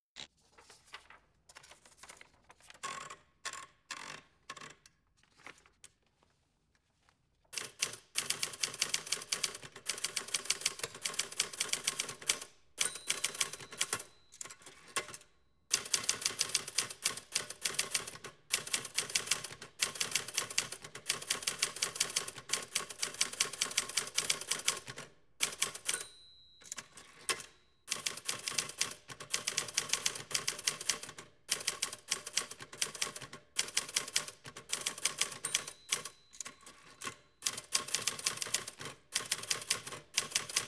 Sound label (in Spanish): teclas